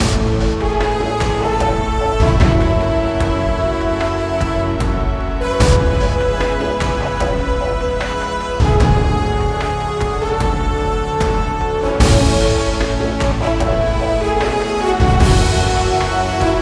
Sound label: cinematic; by burak sound bank; tension gerilim vol 51